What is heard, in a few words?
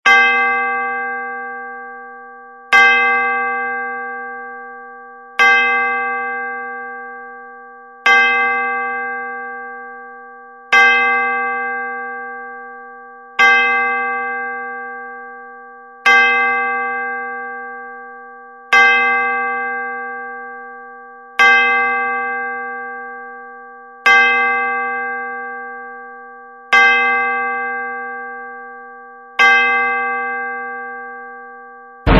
Cow Death